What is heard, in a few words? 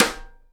SIDE STICK, Live Snares